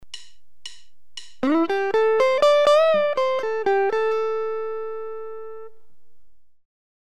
Bend and release in a lead lick, guitar

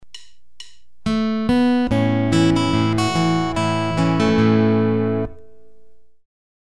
Oh, Susanna in Travis style, guitar

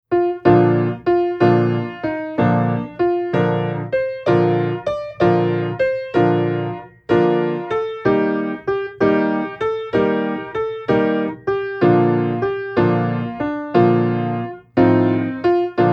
Battle hymn of the republic, Piano oefeningen

